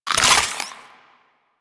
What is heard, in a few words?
Infested Beam Pistol Reload C; Warframe; Weapons